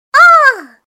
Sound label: ooo; svencoop; FZSaySound